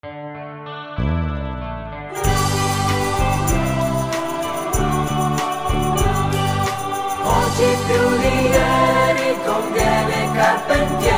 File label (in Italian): natale, jingle